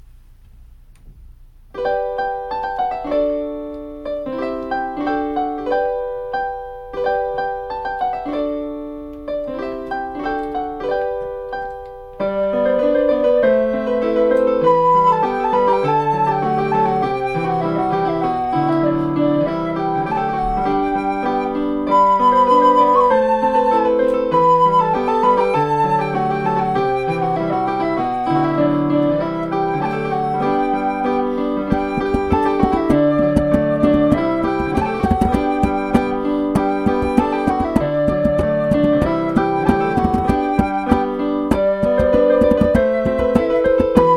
ding dong merrily on high played by me on keyboard recorder and a biscuit tin